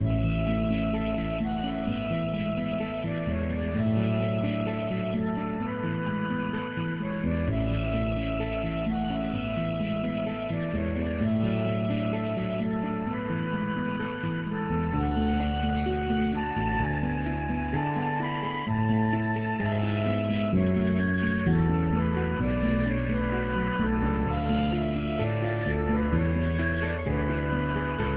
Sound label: Hold Music